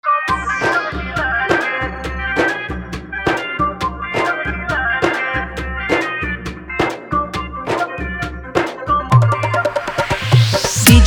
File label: Bolbam Full Dhollki Mix